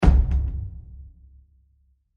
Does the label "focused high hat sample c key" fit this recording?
No